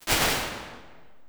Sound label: Cave Drums; Medium Spaces; Apple